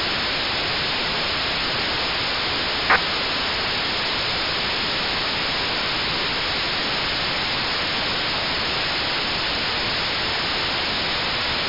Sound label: MSK144 60ms pulse; 432mhz